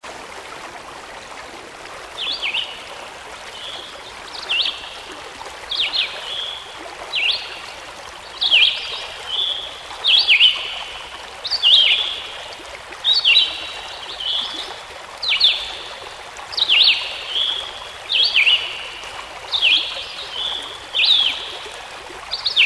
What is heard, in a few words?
song bird, Birdsong